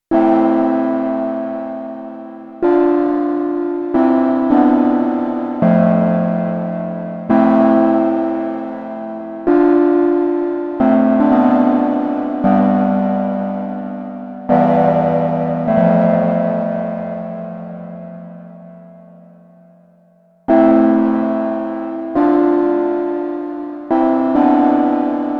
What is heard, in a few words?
MicroMonsta 2 Chords